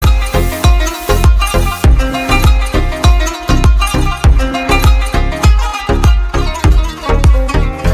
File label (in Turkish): telefon zil sesleri